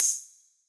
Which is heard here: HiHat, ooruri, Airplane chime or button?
HiHat